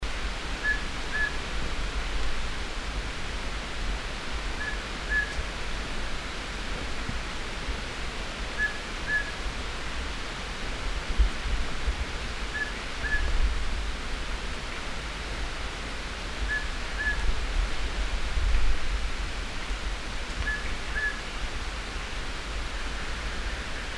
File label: Mountain Scops Owl Song; petit duc tachete otus spilocephalus ssp latouchi